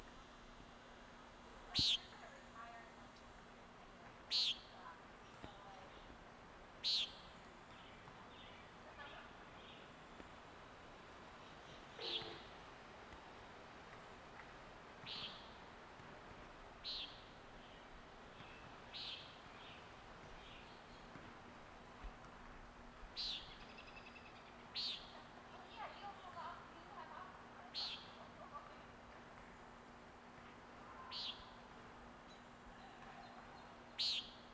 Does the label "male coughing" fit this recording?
No